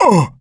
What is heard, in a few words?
hurt major, coach; survivor